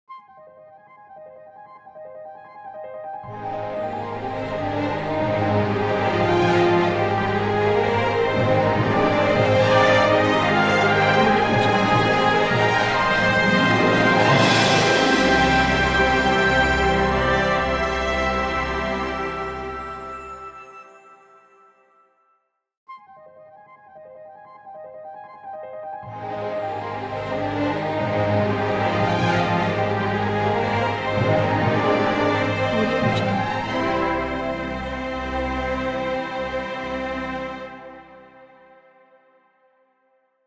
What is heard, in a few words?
modern cinema logo